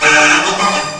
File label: hallelujah, cartoon sfx